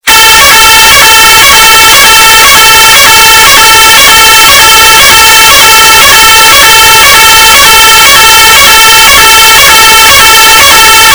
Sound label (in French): alarme incendie puissante